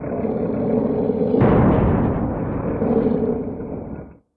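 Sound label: Robot Wall Hit; Descent3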